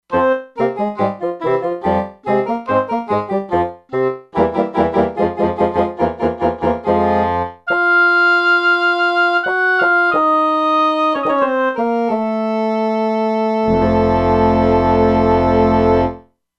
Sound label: Double Reeds; Factory Presets; E-mu Proteus-2